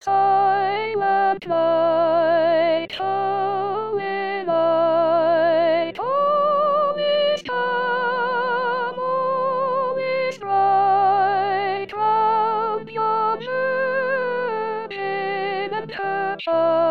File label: Sopranes; Silent Night